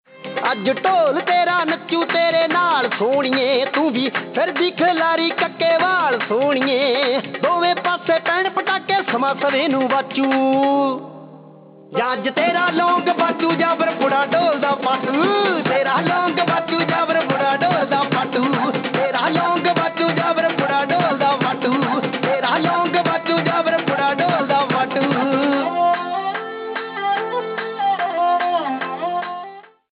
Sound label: Dhol; Punjabi